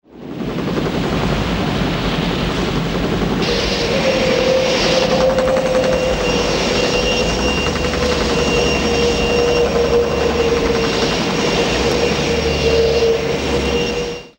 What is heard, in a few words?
Hover; Airwolf